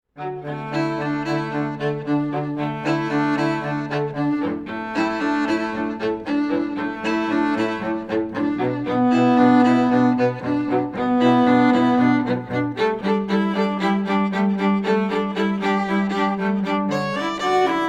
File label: trio